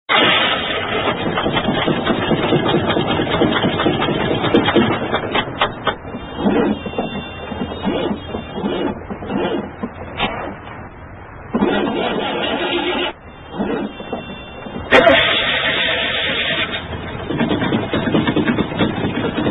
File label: Fiat 126 P; 1977